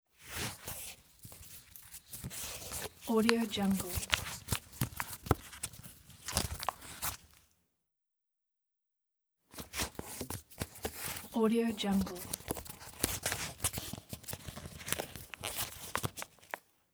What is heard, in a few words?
leather shoes laces pulling and tying up